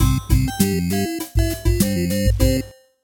intro song; toystory; slinky

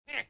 grumpy heh; snow white

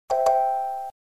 finish Game; toystory; sarge

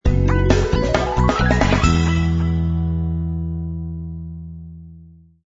Music Sting Short

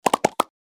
parts off multiple; toystory; mr potatohead

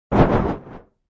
parachute

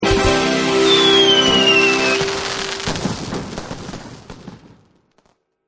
final win; phineas and ferb; snowbrawl

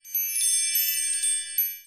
rollover sparkle; princess

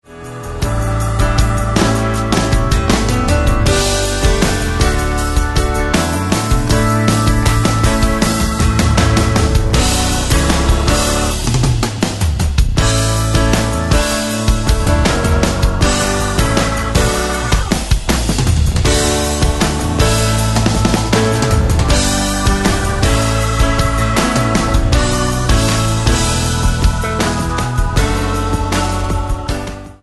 karaoke; disney

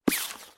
rollover book small; activity center; backyard; preschool time

